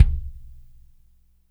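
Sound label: compressed kick, BG KICKS; Spectrasonics; Burning Grooves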